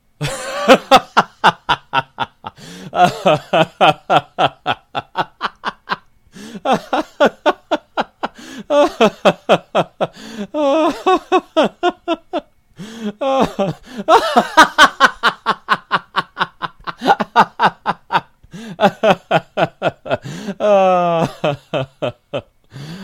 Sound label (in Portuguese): risada longa homem